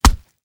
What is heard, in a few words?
blunt light; tfa starwars melee weapons pack; nmrihimpact